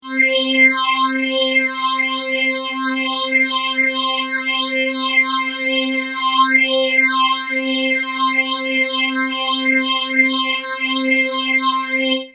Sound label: Mutron biphase